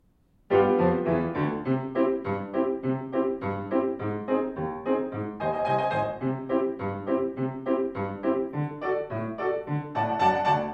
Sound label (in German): Bremen Lied nur Klavier; Bremer